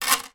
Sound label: household plastic jewelry place down on table